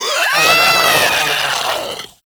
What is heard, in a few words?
Infested Runner Explosion Warning; Warframe; Runners